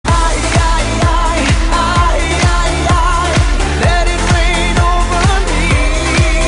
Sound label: ringtone